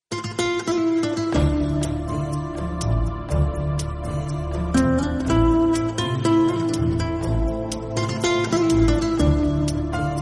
turkish sad guitar; ringtone

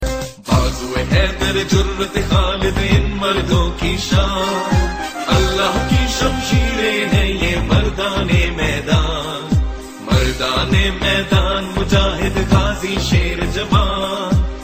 pakistan army song

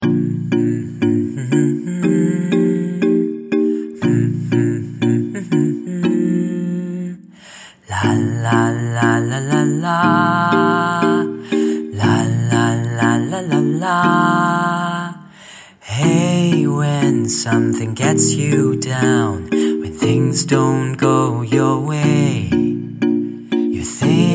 singaporean happy tune ringtone

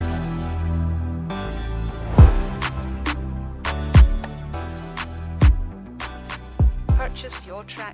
sms ringtone

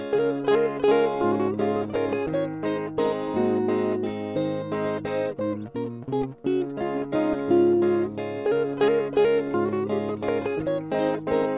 spanish waltz ringtone

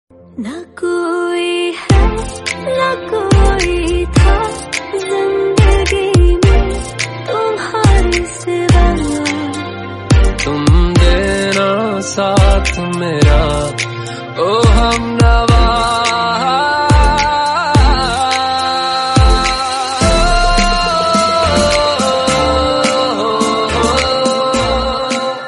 female

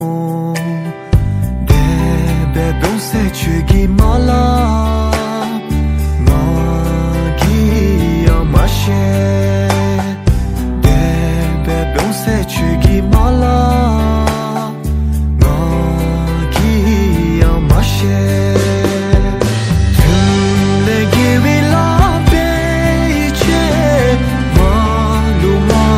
cool best ringtone

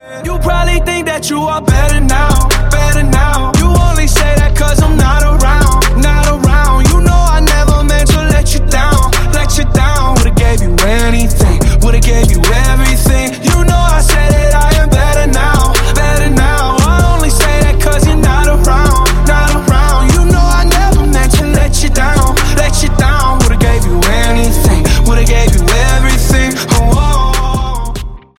ringtone

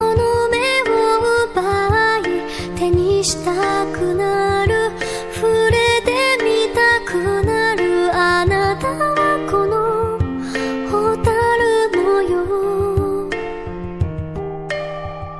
nightcore ringtone

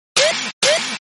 dutch house whoop, ringtone

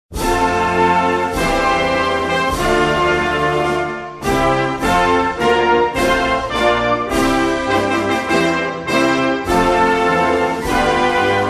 canada national anthem

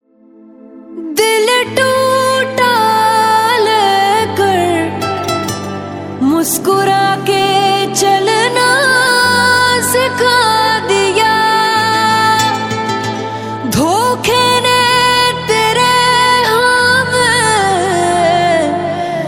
bollywood, ringtone

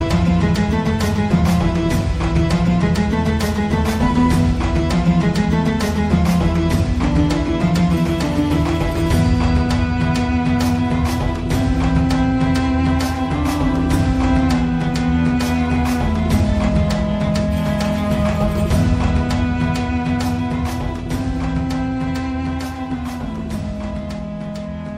ringtone

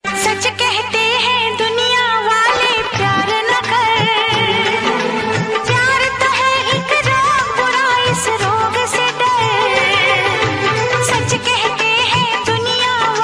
Hindi song ringtone; raja hindustani

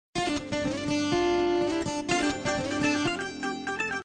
music msg

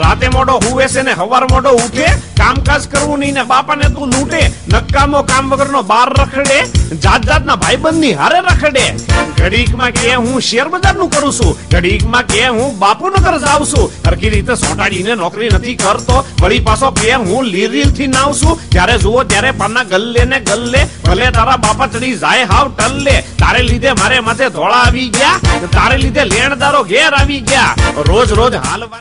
funny gujarati rap; ringtone

